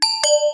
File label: pause retry click